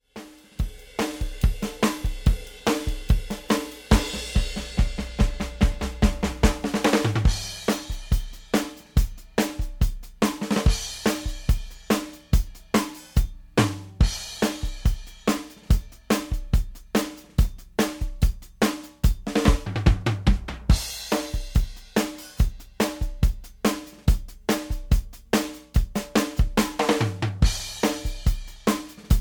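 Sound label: new wave, full mix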